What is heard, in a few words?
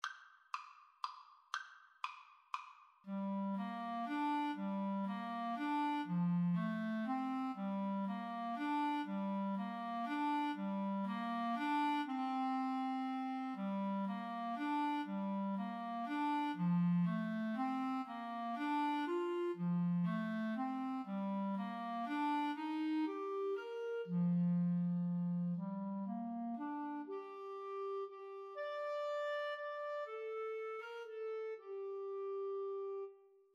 Clarinet Duet